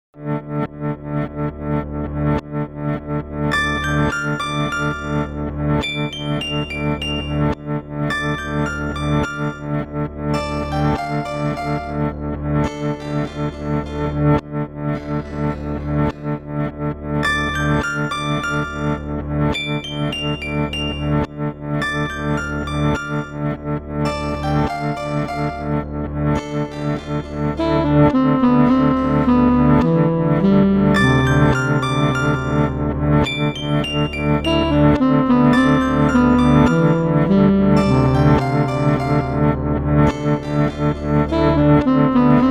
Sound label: ambient; weeklybeats-2016